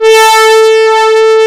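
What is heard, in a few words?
MEMOOG BRASS, Analog Odyssey; E-MU Formula 4000 Series Vol. 3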